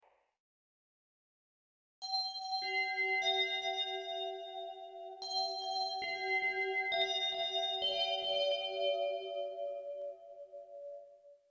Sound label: space bells